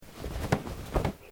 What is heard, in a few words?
Clothing Kimono Sleeve Move